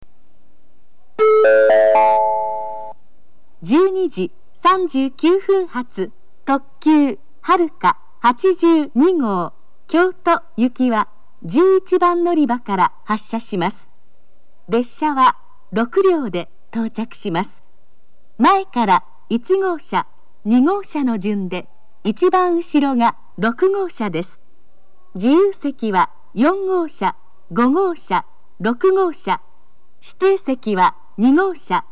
limited express, station; jr-west; shin-osaka; haruka32; kyoto

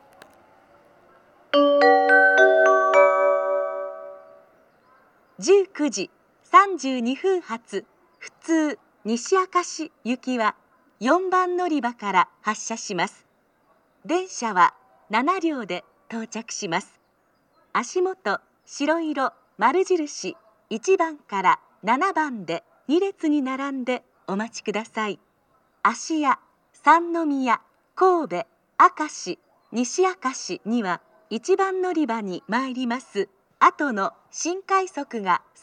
amagasaki local nishi akashi; station; jr-west

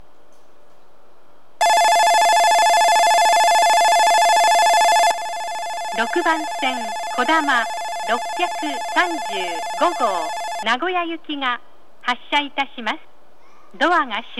shizuoka kodama, station; jr central

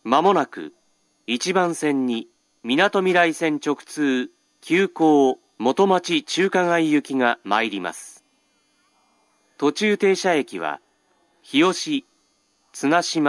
musashi-kosugi express local, station; motomachi-chukagai

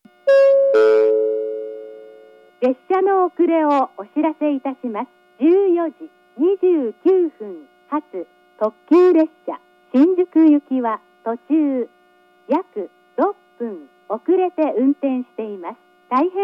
limited express; jr-east; fujimi; shinjuku

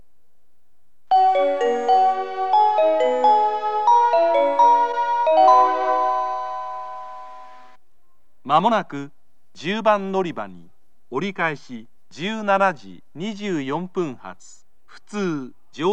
turn local, kyoto; joyo

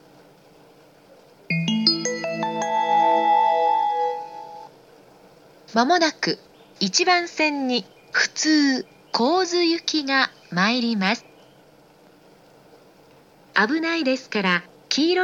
ninomiya local kozu; station; jr-east

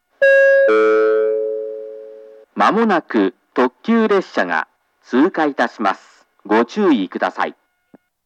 pass limited express; hirata; jr-east

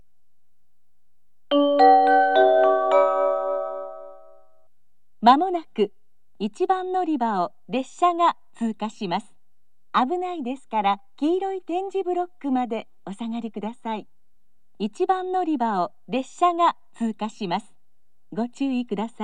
pass, station; tatsuno

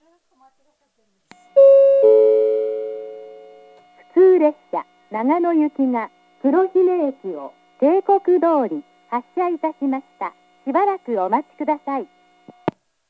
furuma local, station; jr-east; nagano

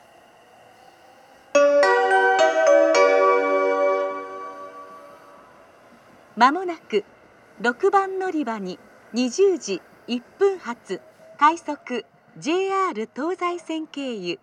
amagasaki rapid nara; station; jr-west

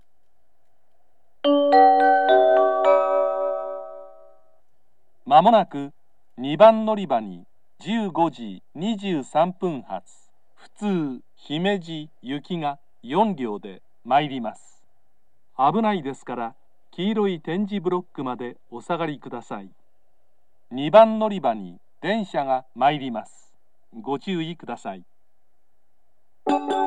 local, station; tatsuno; himeji; jr-west

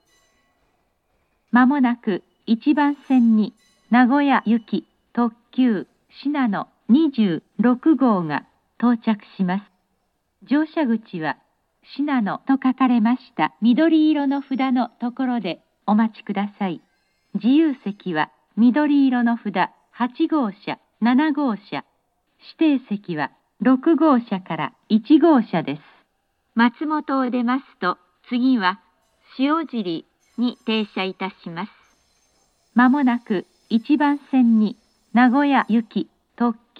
limited express, Matsumoto; shinano26; jr-east

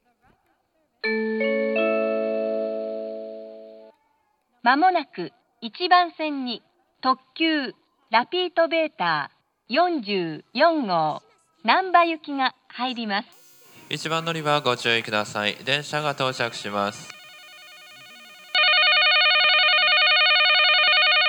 limited express, station; rapito-beta44; nankai-electric-railway; kansai-airport; namba

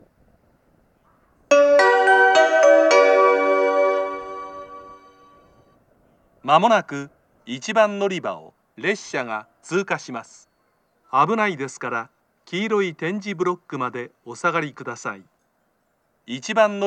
amagasaki pass, station; jr-west

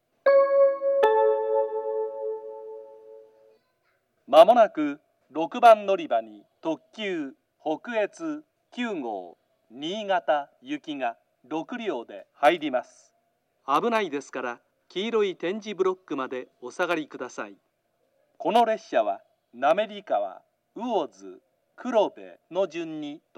toyama limited express; 富山駅 6番線; 接近放送; 特急 / 新潟 / 特急北越9号 新潟行 6両編成です。 / 途中、滑川、魚津、黒部の順に止まります。; jr-west

